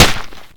web Hit; blobAndConquer; combat

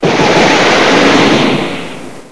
rocket; childsplay; FindsoundData

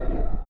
press; ui; scourge

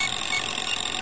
driiing; ensemblist

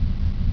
gear move, dc3; flightgear

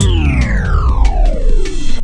game over

